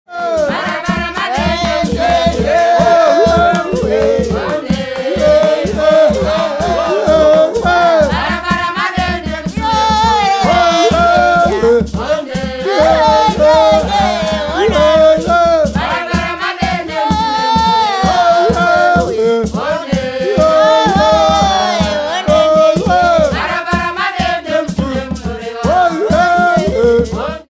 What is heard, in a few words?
mbira